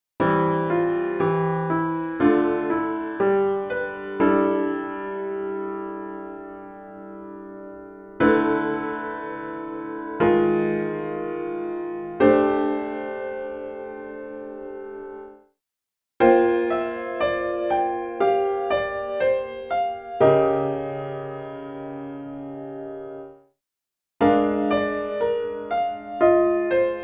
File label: piano, blue tuning